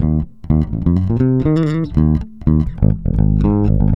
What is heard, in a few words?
REAL BASS, BASSLICKS; Ueberschall; Jam Box